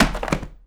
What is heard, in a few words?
Book Drop Wood; SFX Kit; Foley - Misc